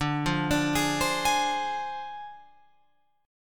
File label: D Minor 7th Flat 5th Guitar Open F; Dm7b5; arpeggiated